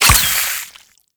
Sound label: Infested Ashen Spike Fire; Warframe